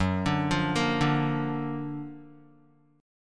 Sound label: Gb Augmented Major 7th Gb down Guitar 4E,4A,5D,5G,5C,6F; Gb+M7 arpeggiated; JGuitar